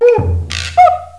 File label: HARPO